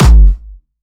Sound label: Distortion Kicks, Bassdrums